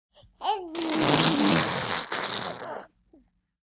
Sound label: Baby Girl Blow Bubble, twelve month old